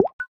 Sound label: Send Key; Samsung SHV-E400S